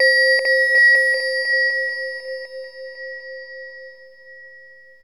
SYNTH BELL

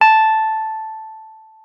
WUFF A5, WURLITZER; Xsample VOL-1

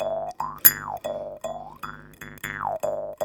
JEWS HARP; Jew's Harp & Spoons

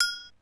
HI AGG; Crypton - DrumBase5000; RX15+17+21L

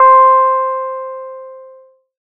PLK C5, SCI Prophet-5 synth keys

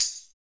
hard tamb; Drums In Your FACE!

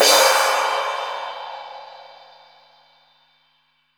crash R; Zero-G; Chemical Beats; CRASH ZOO

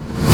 DOOR reverse

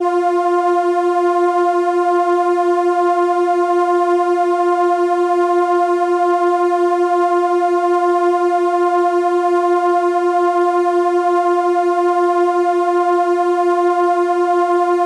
violin F4, ARP Solina

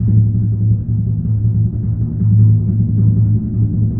TMP A#, Synclavier Percussion

